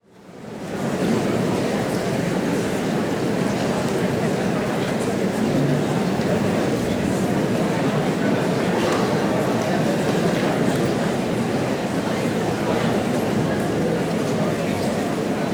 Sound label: Theater General Audience Murmur; Sound Ideas; Walla Series